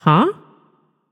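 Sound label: confused, female voice; smite; voicepack; hera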